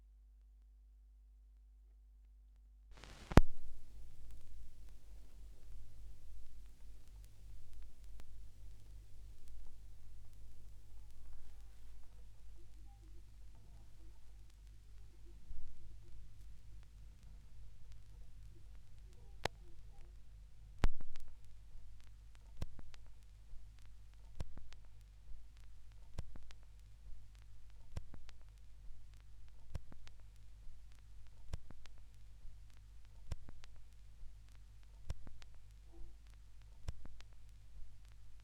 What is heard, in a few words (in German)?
Schallplattengeraeusche; rauschpartikel